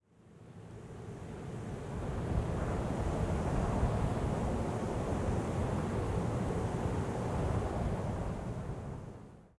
wind snippet

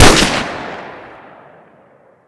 Dragunov Shoot; Modern Weapons Pack

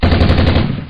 gatling; Manus Civil Package

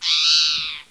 chirp, wildlife

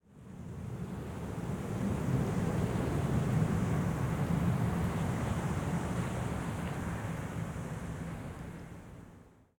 wind snippet

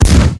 Tank Shell; Stan's vehicle pack

